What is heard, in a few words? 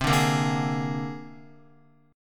C 7th Suspended 2nd Sharp 5th C down Guitar; C7sus2#5 strummed